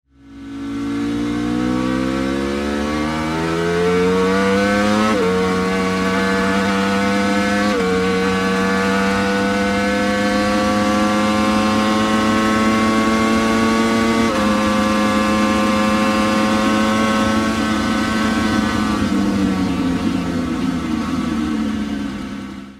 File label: SOUND Desmosedici Stradale Engine